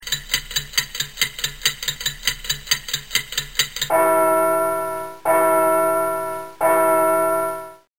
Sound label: clock